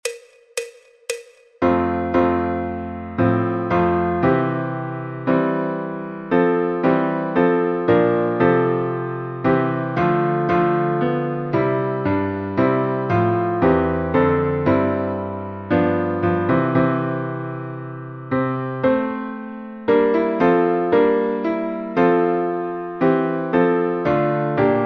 Adeste fideles Piano en FA Mayor F

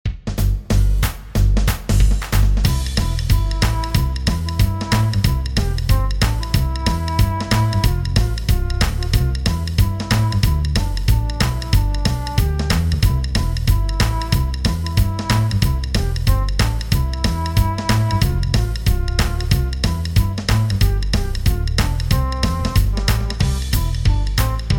Jingle Bells Jazz Corno Inglés en Sol Mayor G

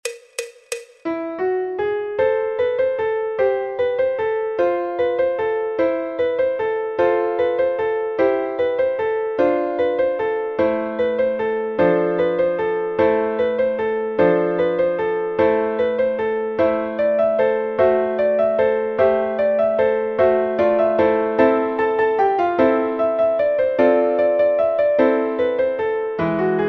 Piano Fácil Campanas; Carol of the bell; Villancico de las Campanas

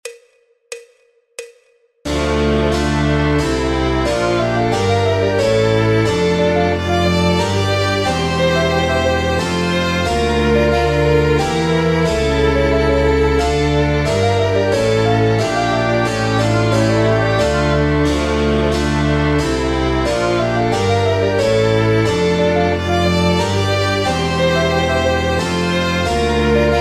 Piano Clavecín o Teclado en Re m; Rondeau Abdelazer Purcell

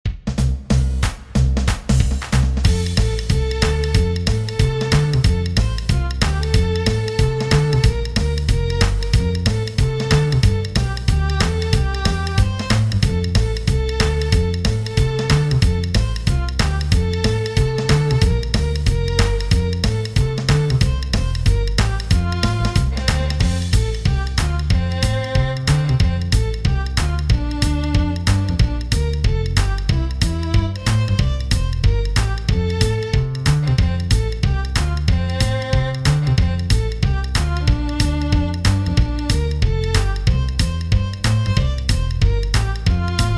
Jingle Bells Jazz Violín en Fa Mayor F

